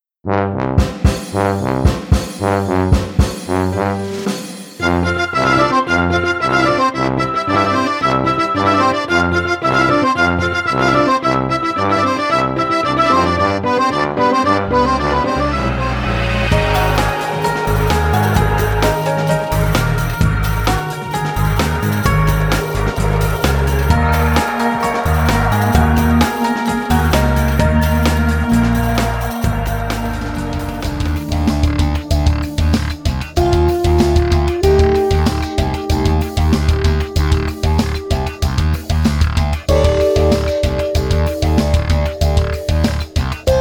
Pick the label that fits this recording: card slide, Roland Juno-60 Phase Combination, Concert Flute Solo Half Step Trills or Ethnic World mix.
Ethnic World mix